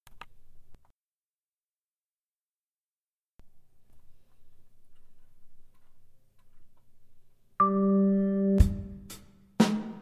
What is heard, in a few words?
Whip Guide Tenor